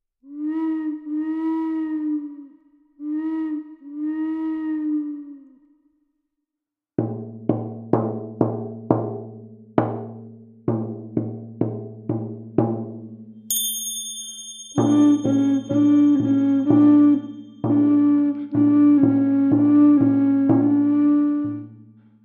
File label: minus1